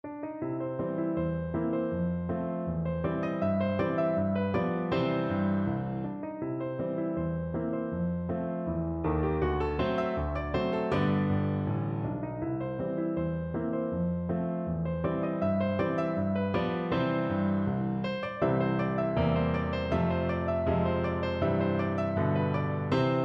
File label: the entertainer, piano duet